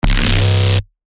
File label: Bass Shots C; Starmada; revealed bass shots vol 4